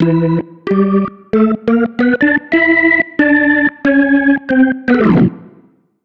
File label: Keys line 90 E, 90bpm, hiphop; musicradar